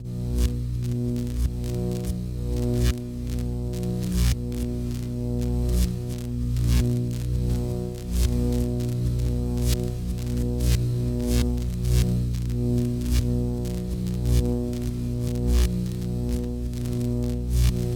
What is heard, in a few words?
Electric Arc Electric Power, Designed, Stereo; SanojSounds; Essentials Vol.1